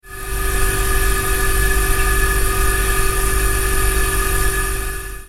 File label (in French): Frigo; bruitage; Electro-menager